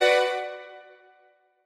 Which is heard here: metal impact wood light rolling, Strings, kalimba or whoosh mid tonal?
Strings